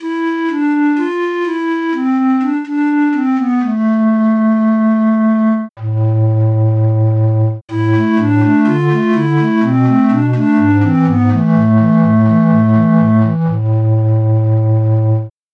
pan flute

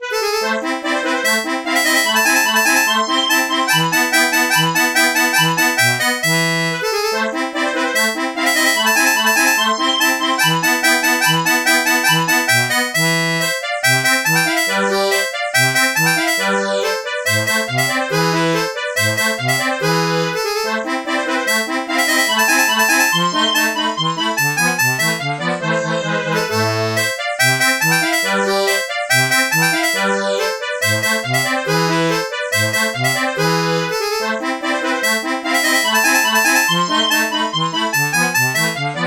bandoneon samples

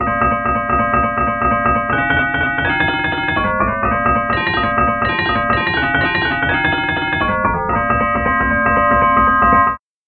telephone bass

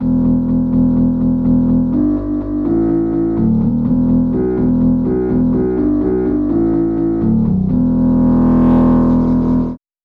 english horn bass

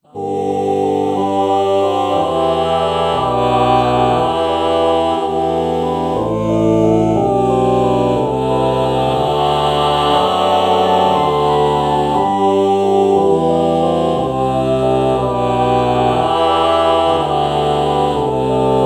ah oh morph

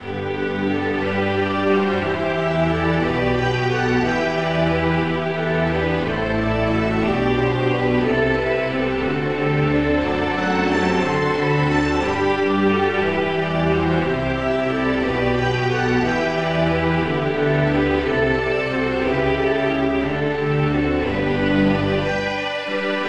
tremolo

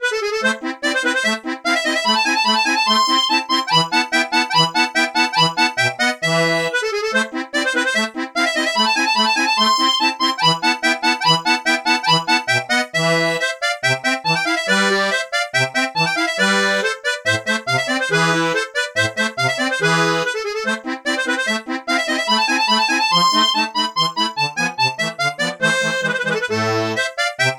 bandoneon

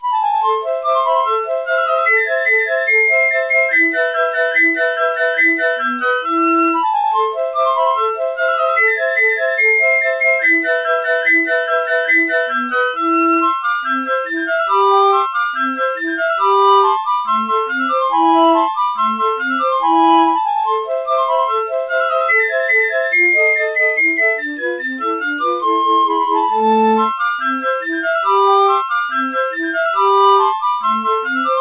piccolo

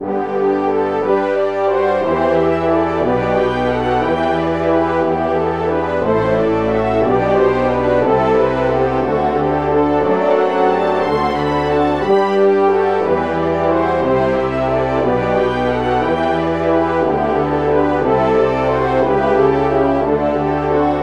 french horns

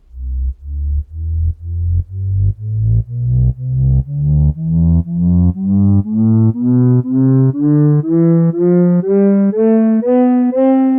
recorder; tonleiter

